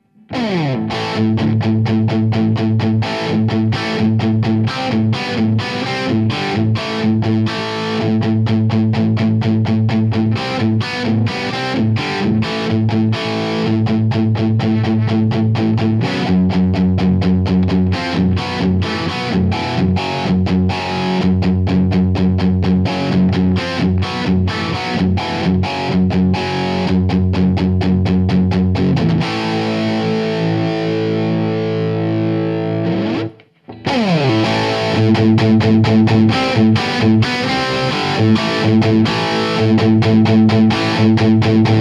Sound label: tsl crunch